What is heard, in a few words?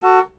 car horn